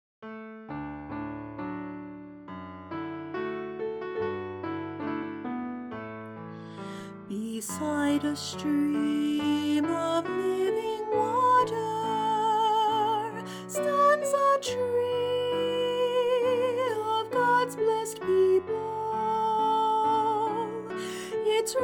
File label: Alto; Practice Recordings; Octavos